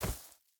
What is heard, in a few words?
footstep burlap grass jump land; Rust